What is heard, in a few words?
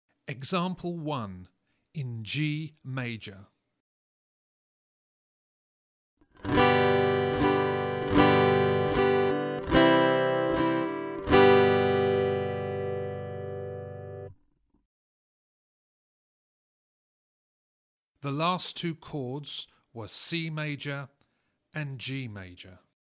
Aural Harmony Test, acoustic guitar; Grade 4